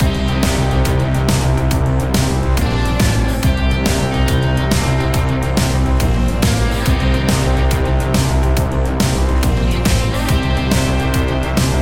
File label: Thunder And Lightning; Agent Intercept